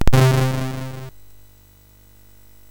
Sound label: FX, MOS6581R3; sid; Software House